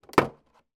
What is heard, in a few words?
foley plastic container small thin lid remove; zapsplat